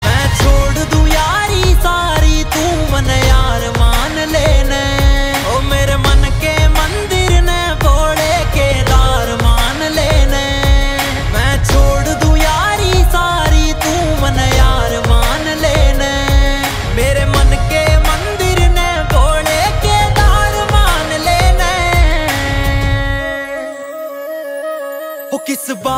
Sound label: Ringtone